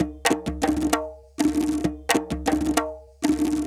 PERSIAN LOOP; BEATESTAN

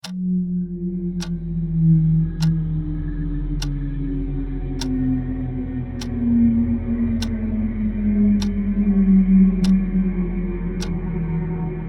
Shepard Tone

